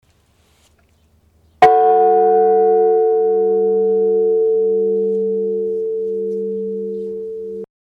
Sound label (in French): cloche; Nissan les Enserune